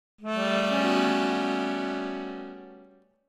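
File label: Accordion Keys Tension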